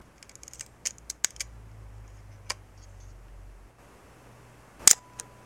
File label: rollei35